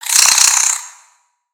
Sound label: Spider Angry